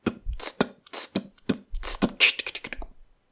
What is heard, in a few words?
probeatboxer